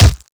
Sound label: character hit; projectiles; bullethit; Max Payne